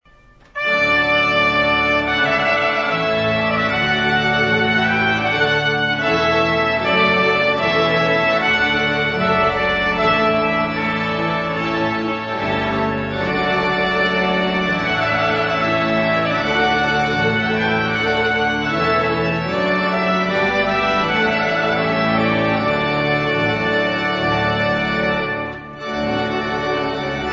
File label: TRUMPET VOLUNTARY